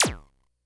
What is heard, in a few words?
Bleep A, Drum Machines, Korg DS-10